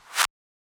Yamaha DJX 2B, Claps C3

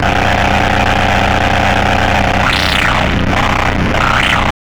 CARTRASH; USB Soundscan vol.01; Hard & Loud Techno; AKAI

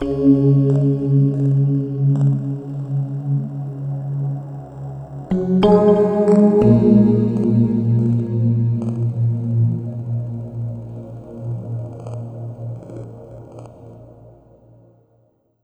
Ambient Keys

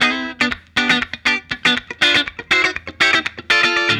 Funky Guitar; Sonic Foundry (Sony Creative Software); What it Is! 70s Analog Funk; The Funky Mama

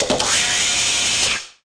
FX Dance

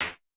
CLAP, Vermona Mono15 Drums; blend with snare; Stereo layers

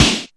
SPECK, snare; Back In Time Records; Big Bang; SNARES

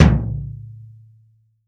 BD, REAL DRUMS, JUNGLE KIT

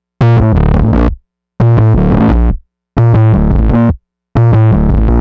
173 Bass, Drum n Bass

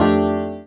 CHORDS; EastWest; Dance Mega Hip Hop Electro

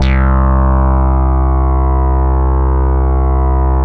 2600 BASSICS, Vintage Keyboards; Big Fish Audio; First Call Vintage Keyboards

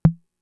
Tom, Fricke MFB-522

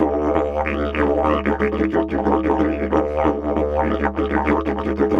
Didge 93 BPM, Didgeridoo; Big Fish Audio - Didgeridoo and Other Primitive Instruments

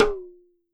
drum, WORLD DRUMS; World Instruments 2; PS MIXED DRUMS

